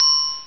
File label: Glockenspiel (C5); Star Trek JR Audio